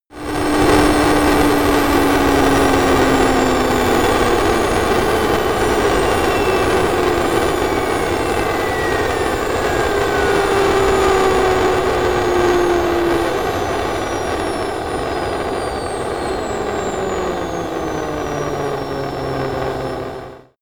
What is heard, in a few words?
military jet fulcrum turbine slow down